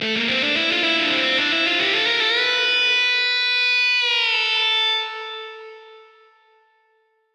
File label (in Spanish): Hero Cansado Guitarra; King Lucas